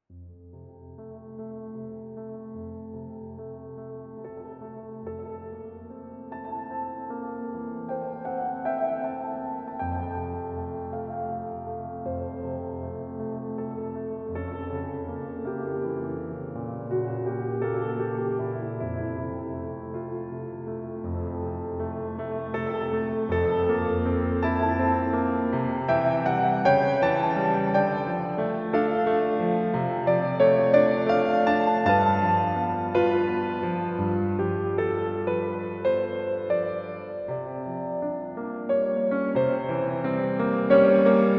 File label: piano; Elite Dangerous film competition 2015